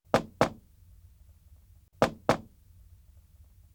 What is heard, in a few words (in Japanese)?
ノック音 2回